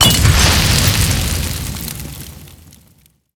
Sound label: explosion molotov